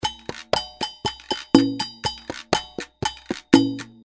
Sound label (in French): mix danzon, musique cubaine